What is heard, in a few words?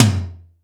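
acoustic tom, drums; East West; Global Sounds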